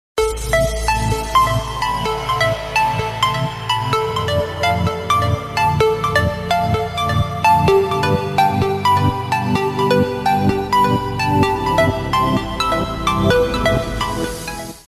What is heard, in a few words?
NEW SMS MELODY RINGTONE